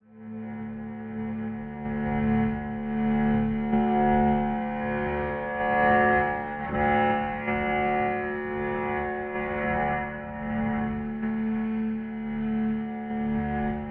String Subdued D; String Redux; Dean Valentine

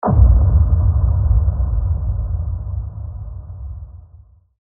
Boom; Aftermath; AllTimeFavorite

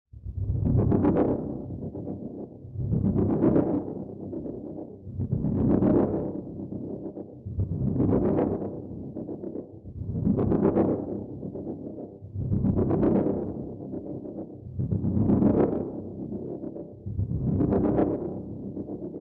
Angry Pulse Remix G 100bpm; Matthew Simms

